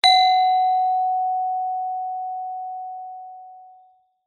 musical instrument gender small gamelan single mallet hit high